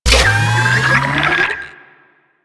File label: Purify Machine Activate; Ambience; Warframe; Infested Eris; Encounters